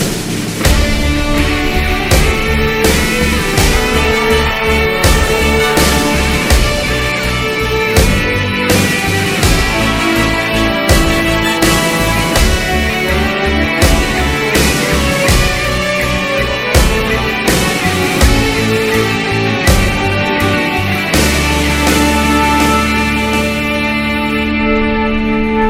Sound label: National Anthem, Pakistani